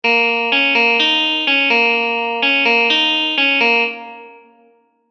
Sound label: guitar riff; Three Blind Mice Improvisation Experiments 2 – Rhythm